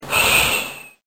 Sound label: musical